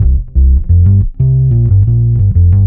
bass, Loops Phonky

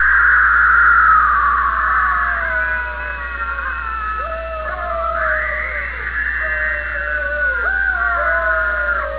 scream ghostly; ooh ahh

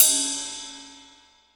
Cymbals

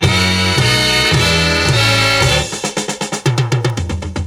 horns, phonky loops

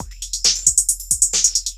filter groove, Drum loops, Electro

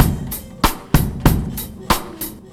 trumpet, Drum loops Boombap

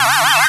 funky stab, zaps and beeps

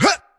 huh male, Voice

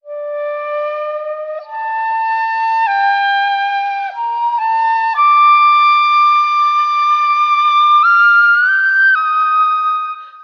flute dissonance, Sad; Loops

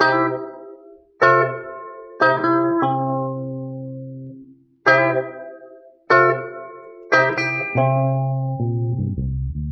guitar, Phonky Loops

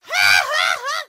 laugh cartoon

